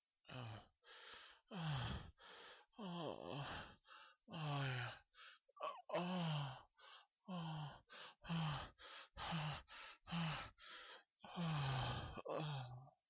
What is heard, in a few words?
moans